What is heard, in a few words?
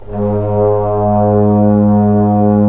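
brass trombones; Roland LA Composer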